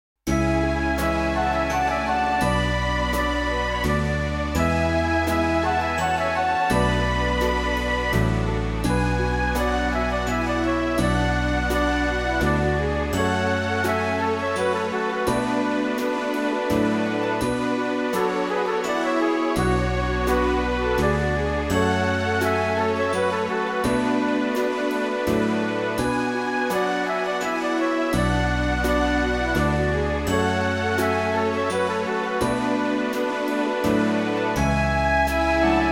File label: classic waltz